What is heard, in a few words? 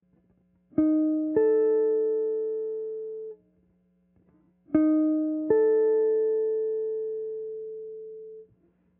Intervals Tri